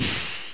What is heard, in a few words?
Exhale; DragThing 4.0.2